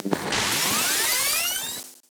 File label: Revive; ArmyRaid; Interface and Item Sounds